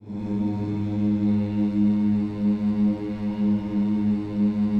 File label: HMMS G#, Gospel; Spectrasonics; Vocal Planet Vol.1 Gospel; akai